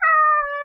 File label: cat; NewGamePhysics; GravityChooser